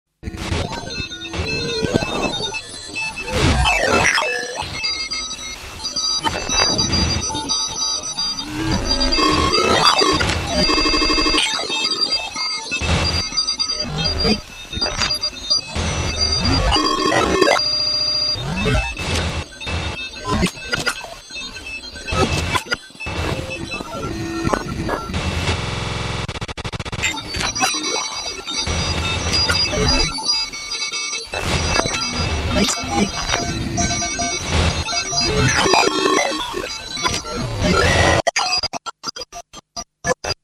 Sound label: trombone